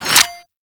Hack Minigame Transfer; Entrati

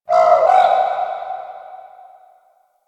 Deer Ruckus Vocal; Warframe; Quests; Entrati Labs

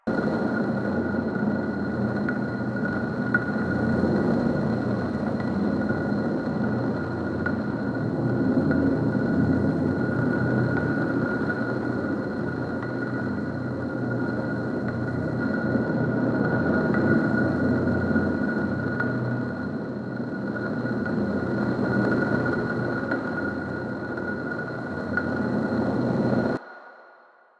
Cave Interior Bed Loop; Ambience; Warframe; Stalker Cave

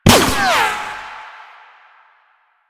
Tank Shield Impact; Enemies; Warframe; Lasrian

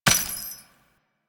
Step Default, footsteps; Warframe; Grineer Royal Jester; Foley

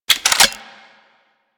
Grineer Four Barrel Rifle mech load

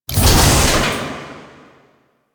Door Open; Warframe; The New War; Veso Intro

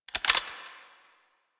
Foley Weapon Swap Accent Pistol; Warframe; Kahl

